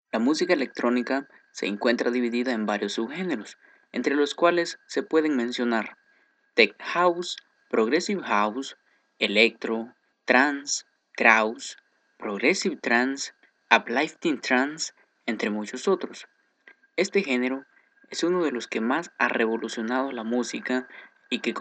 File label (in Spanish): muestra electro beat